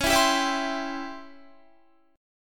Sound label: C sharp Minor Major 7th Flat 5th Guitar; C#mM7b5 strummed; 20,19,19,21,19,20; JGuitar